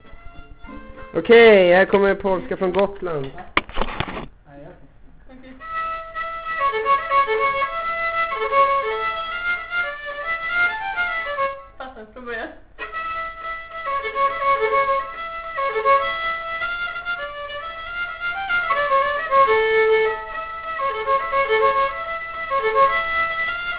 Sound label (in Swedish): polska fr Gotland